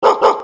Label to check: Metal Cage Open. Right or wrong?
Wrong